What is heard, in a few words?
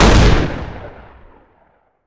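Weapon double barrel shotgun fire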